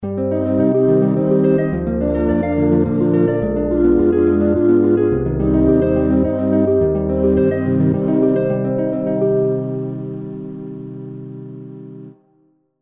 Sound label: Trumpet Song